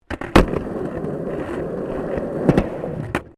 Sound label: SKATEBOARD UNDERSIDE CONCRETE; Skateboard sounds; Skateboarding; Sports